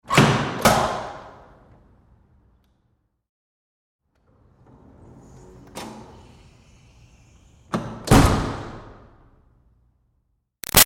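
Door Metal Commercial Push Bar interior Hard open-close; Doors; Big Room Sound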